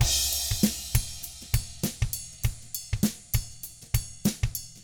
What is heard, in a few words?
FUNKY Drums